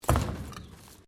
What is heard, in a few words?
Bricks Metal Movement; Material components for constructing more complex sounds